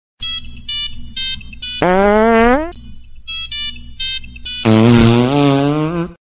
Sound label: Nokia Fart